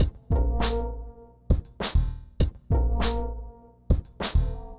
Remixed Drums 100BPM; The Roots Drumloops

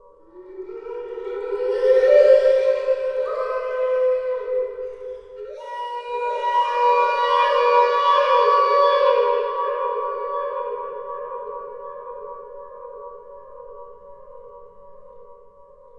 Chasm Flute; Sonic Foundry (Sony Creative Software); Ma Ja Le Ethereal Textures; Flutes

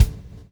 PULL, Backbeat; Spectrasonics - Backbeat

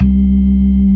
CLASSIC C3, Synth and Organ

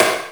Snare, Akai MPC-500; Toolkits; Snare TKit

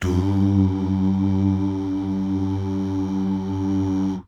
DUUH

